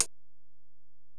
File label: HiHat, Alesis DM-PRO